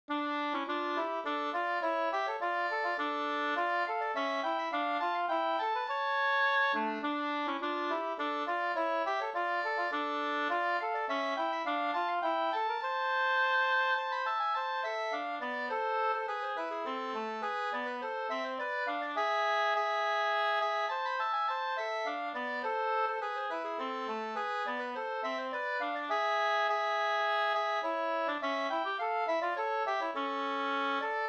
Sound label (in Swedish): polska Storpolska